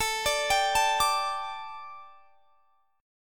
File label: A 7th Suspended 4th, chord; A7sus4 arpeggiated